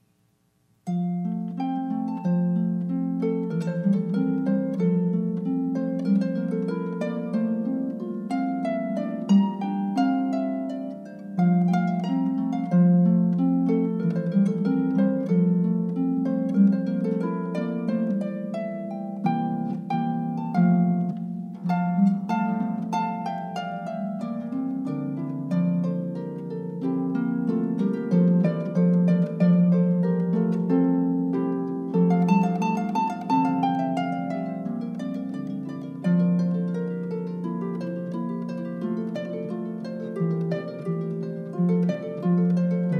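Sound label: Sonatina in F Major, Harp; Sontina in F Major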